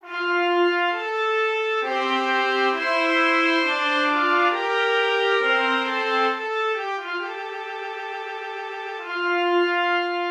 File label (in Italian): studio sugli stretti; Ronge; di Albrechtsberger